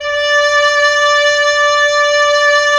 Oboe; Roland - LA Composer vol. 4

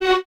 Violins F#4; Miroslav Vitous; String Ensembles; Detache

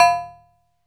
COWBELL; ALL DRUMSETS; Music Store Sound Service; Einstein

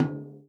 TOM, Real Drum Kit

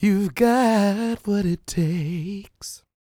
CROON, Vocal Blues & Soul MELLOW

